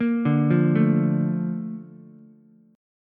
A sharp Major 7th, Ukulele; A#M7; arpeggiated; JGuitar

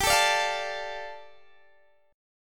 G 7th Suspended 2nd, Guitar Open D

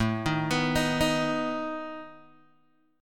A Suspended 2nd Flat 5th A down Guitar DADGAD

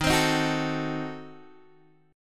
Eb Diminished 7th Eb, Guitar Open G

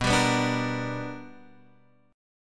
B Minor Major 7th Sharp 5th

